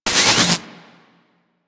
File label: Powerplant Large Electricity Hit; Warframe; Corpus; Railjack; Tether Power Plant Exterior